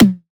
tom, Drum One Shots; candy; Slate; SD MIDNIGHT Sample Pack

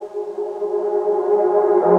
vocal swell, Abm; SD AURORA Sample Pack; Slate

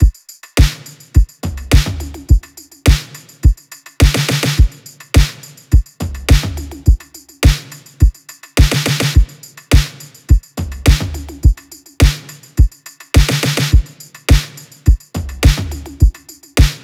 drums 105, Drum Loops, Full Drums; Slate; SD MIDNIGHT Sample Pack; Shelby

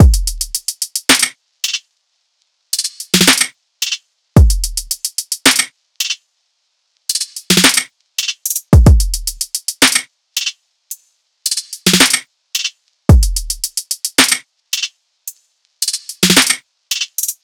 drums 110, Full Drum Loops; Slate; SEISMIC Drum Sample Pack

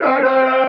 vocal vibe, C; SD AURORA Sample Pack; Slate

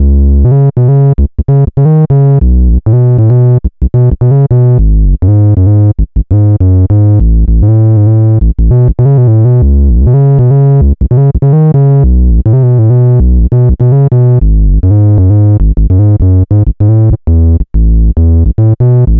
Synth loop 100 C#m, Synth Bass Loops; Slate Digital BASSHOLE Sample Pack

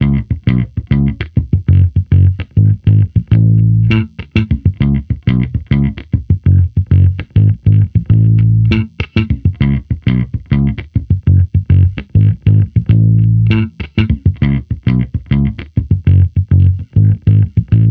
Amped loop 100 C#m, Electric Bass Loops; Slate Digital BASSHOLE Sample Pack

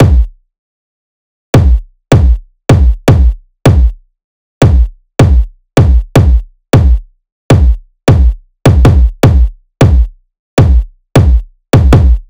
kick 156, Kick Loops; Drum Sample Pack; SD SEISMIC; Slate

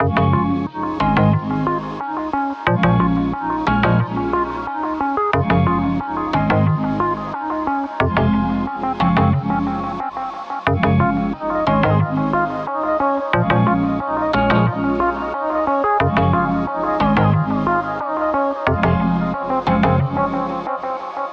songstarter 90 C#m; Slate; SD PASADENA Pop Sample Pack

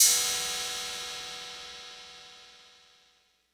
Cymbal Sizzle, 808; Slate; Vintage Drum Machines Volume 2 808 Edition

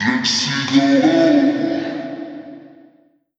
Vocal Sample Pack Phrases C#m; Slate Digital Omnivox Vocal Sample Pack

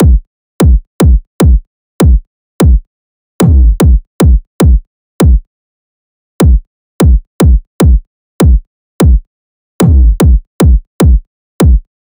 kick 150, Kick Loops; drum sample pack; SEISMIC; Slate

